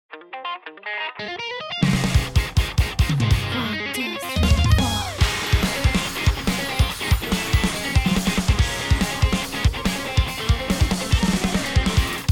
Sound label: Bass MinusOne; NCS オーディション楽曲